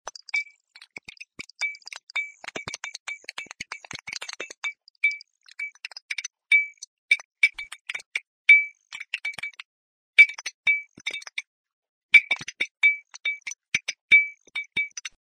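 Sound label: sound of a flickering lamp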